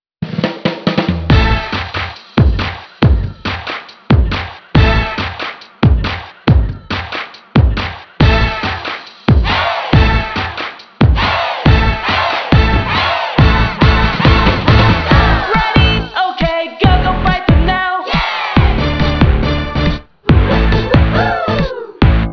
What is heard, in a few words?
Cheer IT up Dance Music